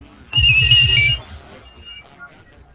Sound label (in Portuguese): sinal; atlantida